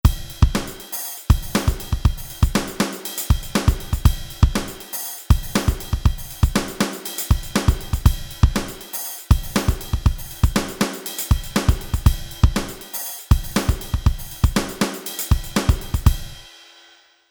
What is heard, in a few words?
Speed Drum Patter